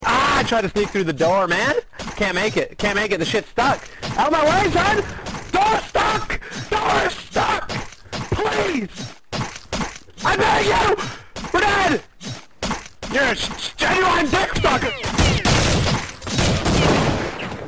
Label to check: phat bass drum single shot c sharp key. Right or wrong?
Wrong